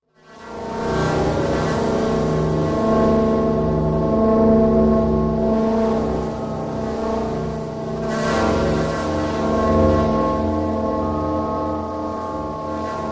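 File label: Crucial Thought Slow Metallic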